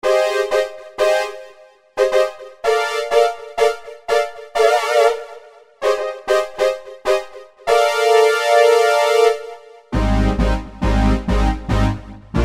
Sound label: Sawpad, Yamaha TG77; Factory Presets